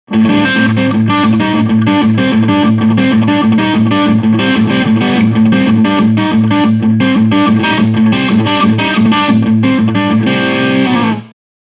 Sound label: Crunch